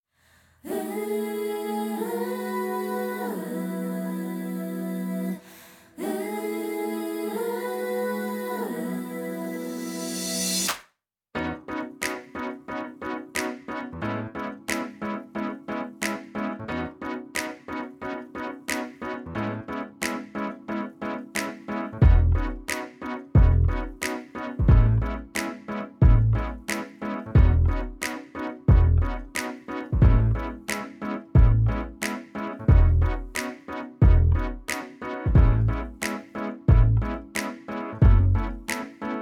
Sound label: inst